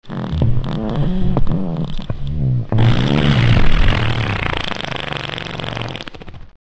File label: Fart Sound